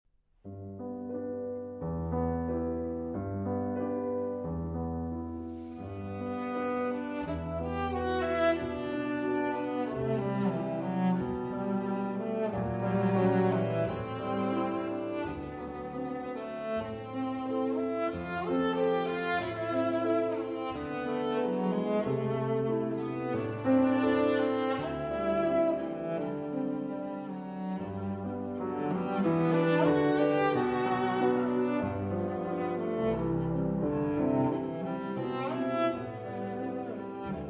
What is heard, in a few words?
Cello & Piano; 4 Short Pieces For Violin & Piano, H. 104 No. 2, Spring Song